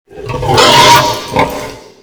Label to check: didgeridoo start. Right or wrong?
Wrong